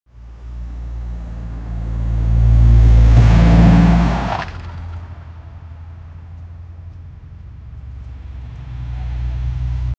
a car hitting a barrier with a crunching impact

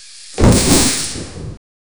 electric lightning strike, electricity

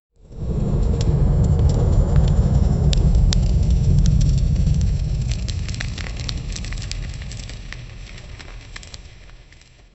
hell ambiance roaring fir; rocks falling

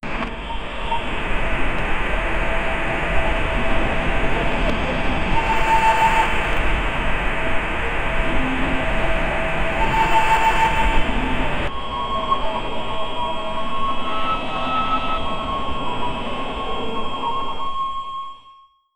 the sound of the city, sci-fi

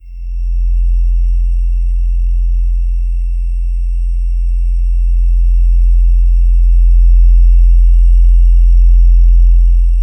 digital countdown beep; warning

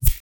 slash katana

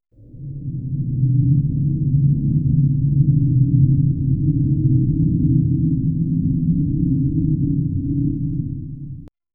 mystical cave ambience

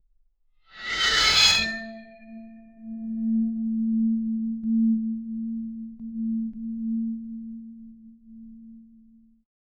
metal on wood object holl; object interaction

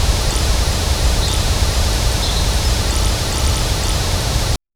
gentle autumn forest ambi

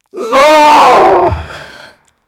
old man groaning with gre; sound effect; body sounds

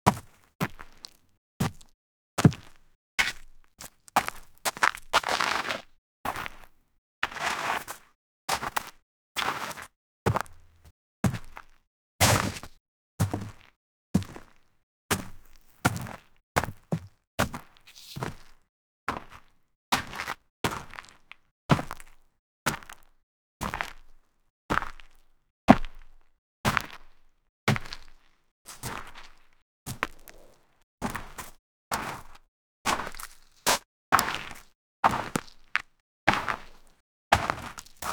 dry gritty concrete foots

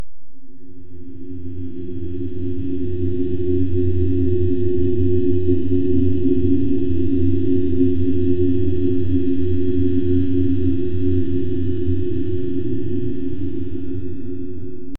sci-fi drone sound

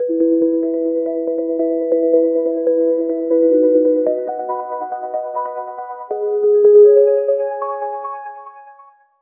generate melancholic arpe, arpeggio

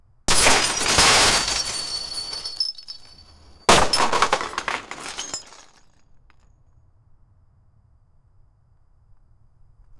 huge lego spaceship crash, toys

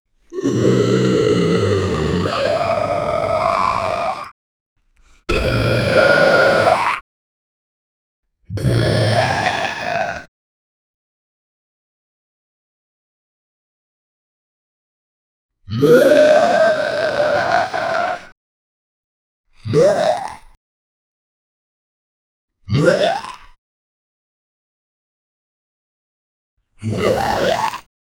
zombie roar many times; apocalypse

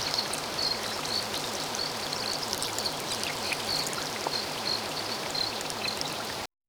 gentle river flow muddy

